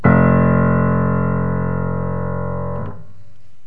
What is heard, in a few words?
piano low f